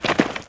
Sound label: Sheep Jump; Worms3D